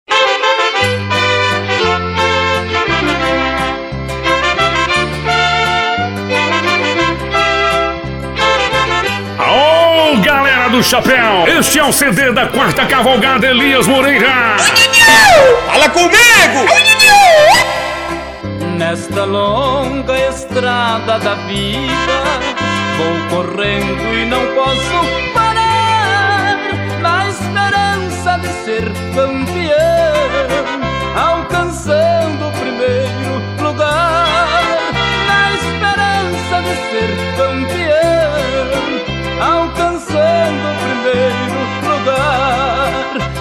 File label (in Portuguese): sertanejo classico